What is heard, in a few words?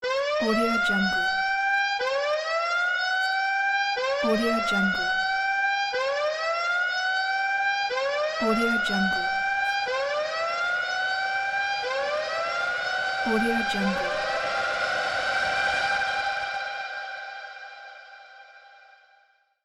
war siren; arialiciyan